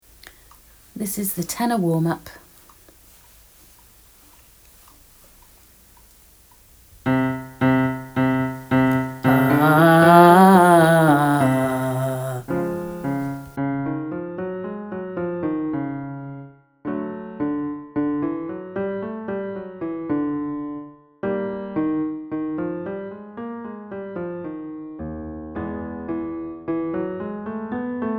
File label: Tenor WARM UP